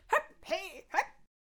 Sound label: Dragon Carrier Attack Vocals